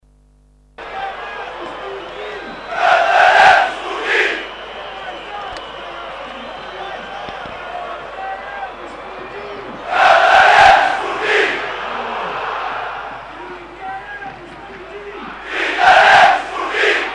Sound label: Chants, porto